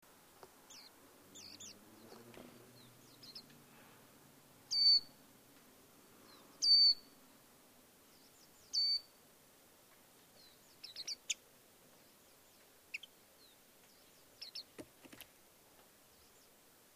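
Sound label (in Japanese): mejiro, Japanese white-eye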